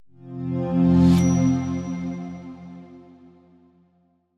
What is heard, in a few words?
StartUp